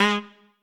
Trumpet melo

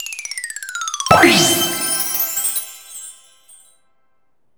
MAP NEW OPEN; maimai

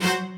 strings

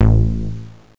bass; Angelic Layer

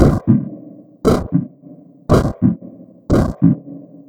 heartbeat; 主播女孩重度依赖

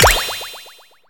SE COLLECTION SET; maimai

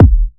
Kick; WAProd Free Kick Samples 2018; WA Kick Pack 7

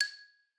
xylo

